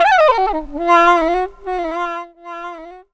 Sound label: SAX RIF; E-Lab; X Static Goldmine 2; akai